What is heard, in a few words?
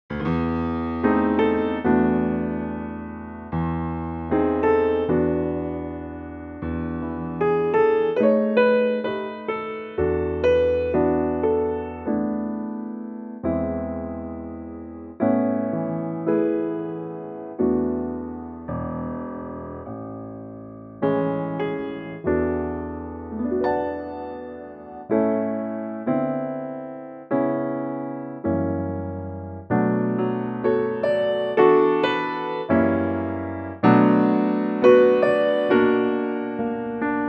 piano A; key - A - vocal range - E to B; Gorgeous piano only arrangement; with no tempo in mind